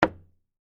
Door Knock Knuckle, Foley, Door, Knock, Knuckle, Single, Hard, Wood, Door; Door Knocking